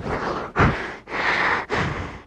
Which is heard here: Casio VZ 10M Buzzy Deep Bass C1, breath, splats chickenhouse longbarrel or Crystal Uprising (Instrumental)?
breath